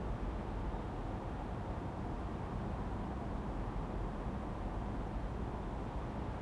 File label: BG Urban Busy; blue archive